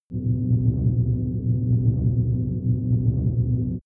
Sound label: abandoned facility